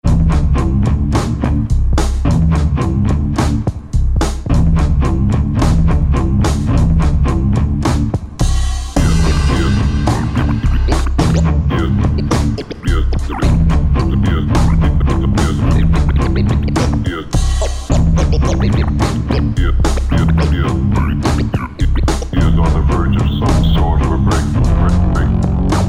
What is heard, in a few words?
Bad Loops Rising (Bonus Scratches); Burntablism Volume 2